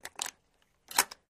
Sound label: Rifle Cock Clicks, mvmt